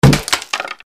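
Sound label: weapon sword deflect wood; conan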